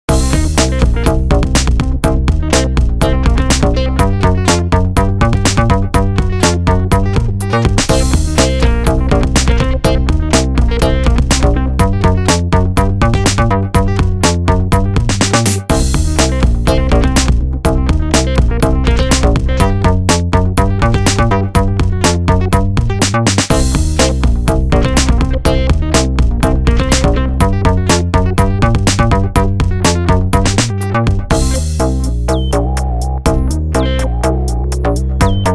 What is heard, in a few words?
Creepy Dance